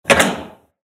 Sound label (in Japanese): 扉を開ける; ＲＰＧゲームのダンジョンやフィールド; ダンジョン・フィールドの効果音